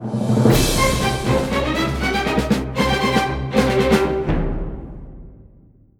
Jingle, Level Completed; rayman legends the complete soundtrack gamerip 2013

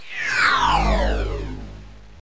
Brave Bird

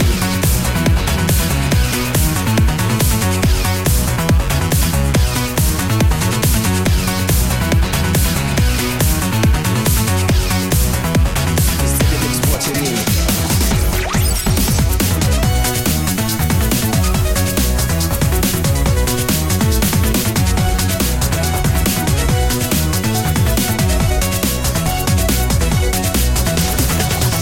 Music Main Menu; Sonic Momentum; SAGE 21

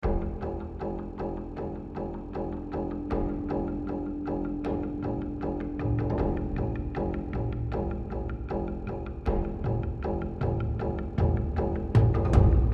Rage Combat

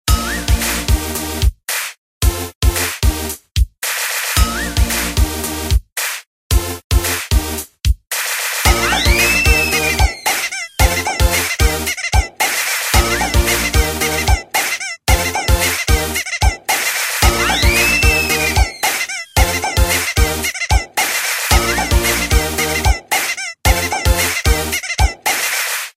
Game Over Loop; crazy alien

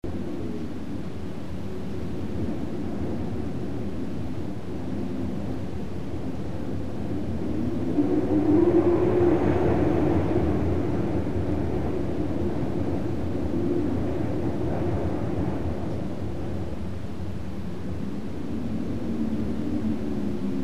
WIND Summit Drone Hall Gusts; gamerip; The Unfinished Swan

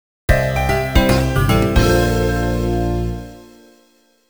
Level Start; Garfield's Nightmare; Nintendo DS

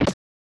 Hit

